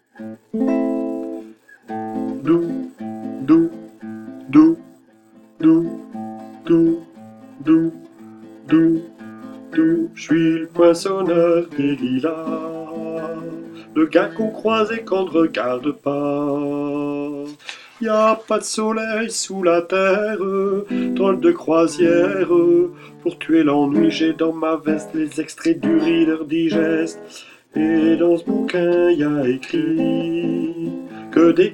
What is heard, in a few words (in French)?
basse; chorale